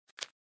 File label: Paper Close